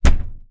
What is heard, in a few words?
household door wooden slam shut hard internal